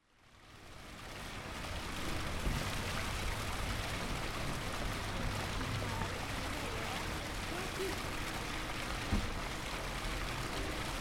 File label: Beverley Brook Barn Elms